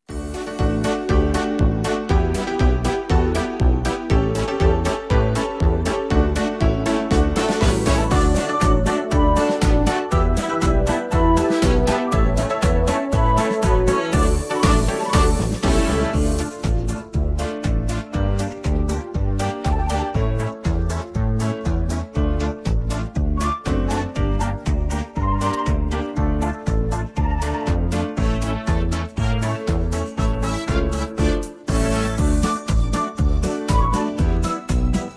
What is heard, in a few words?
instrumental